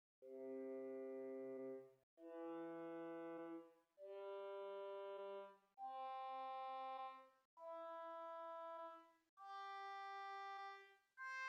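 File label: Swell Celeste 8 arpeggio, organ; Hauptwerk; St Annes Moseley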